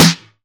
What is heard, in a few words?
airy sizzle snare drum sound e key